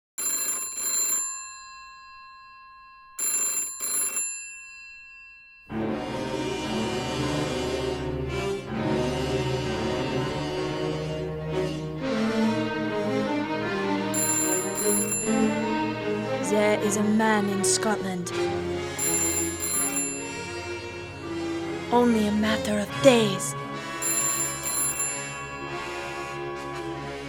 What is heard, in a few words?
Phone Ring